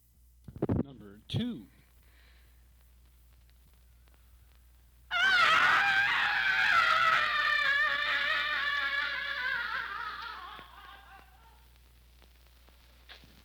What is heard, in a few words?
Scream; Lady Macbeth